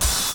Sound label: Nasty Spalsh, layering samples Drum Bits